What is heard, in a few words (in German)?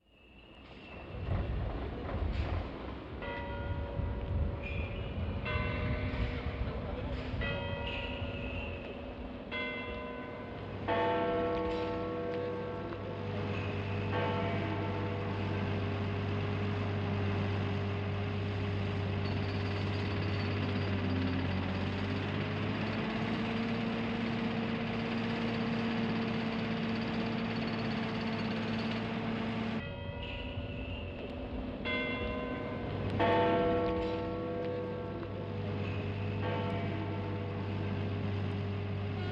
geräusche (verkehr, uhr, baustelle); Großstadtgeraeusche